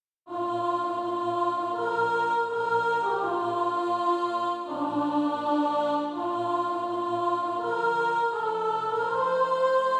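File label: soprano, Practise Music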